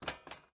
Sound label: rocket reload turn start